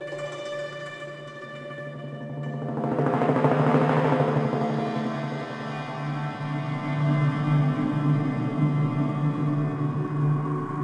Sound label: Ambient; Bolt